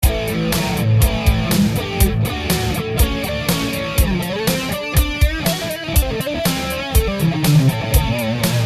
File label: drum guitar; digitech gnx